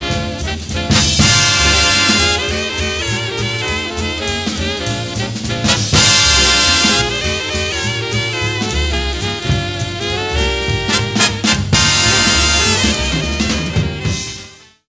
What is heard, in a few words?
bigband, sax